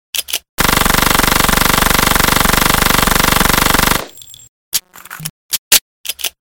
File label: gun